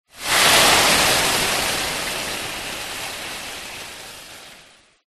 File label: household water pour pan sizzle burn